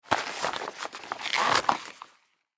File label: Gloves Rubber Take Off; Dressing Sound Effects; Human; Foley